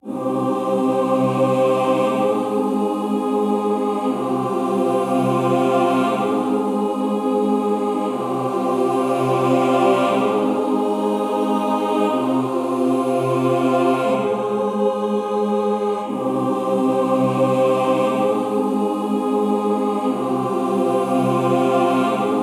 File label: Choir - Cm 120BPM; Construction Kits; STEMS; GhostHack; Ghosthack - SkyRealm; Kit 12 - Ravensthorn Manor